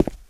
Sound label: tile, footsteps, survivor, run